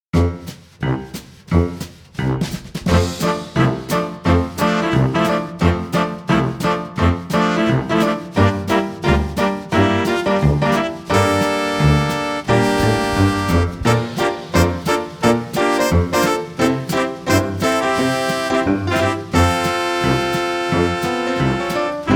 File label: Fun Old School Jazz